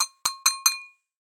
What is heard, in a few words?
dishes